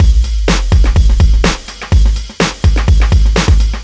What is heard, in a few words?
Hit